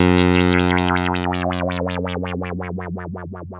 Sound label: 2600 F2, ARP 2600 Synthesizer; Hollywood Edge - Giorgio Moroder Rare Synthesizer Collection